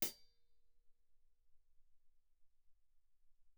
hihat, normal, mf; Percussion; cymbals

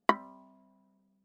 Viola pizz ff sul D B5; MIS Pitches - 2014; Strings

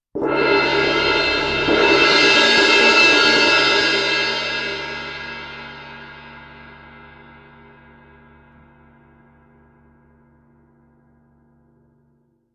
crash mallet roll, Suspended Cymbals; Percussion

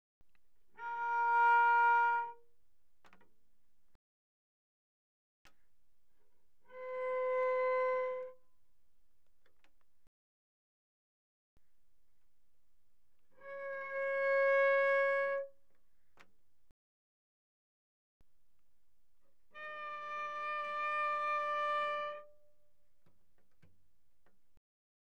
Cello arco pp sul D Bb4D5; Strings

